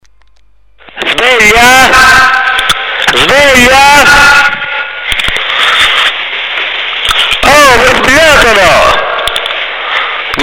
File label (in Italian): sveglia